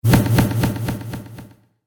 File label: Cinematic Hit, Trailer Sound Effects; sound design